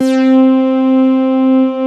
MICROLD C3-L, Moog; USB Soundscan vol.49 - Analog Fantasies; AKAI

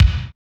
R-50 DRUMZ

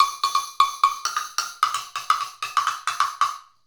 Cow Bell; Sonic Foundry (Sony Creative Software) - Essential Percussion

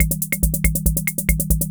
140 POPS; Best Service; XX Large Most Wanted; Sample CDs

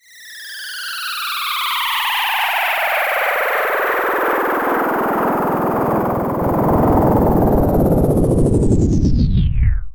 Sweeps Down

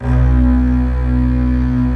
LO ST STRING

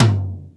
Tom Lo, Korg 05R-W Korg Toms; 300 Drum Machines

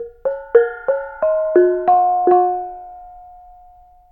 BONANG; Spectrasonics; Heart of Asia; BONANG PH

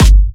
Electro Kicks

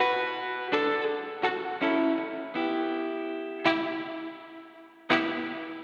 Soul Guitar, 70s Analog Funk; Sonic Foundry (Sony Creative Software); What it Is!

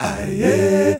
Male Voice, African Voices

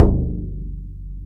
Bodhran Lo

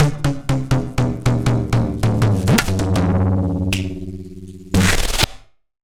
tabla, 80 bpm; Zero-G; Pure Tabla

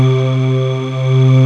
VOICE JD800; Best Service Gigapack 1

